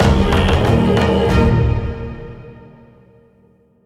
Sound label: Old Kingdom Victory; song of iron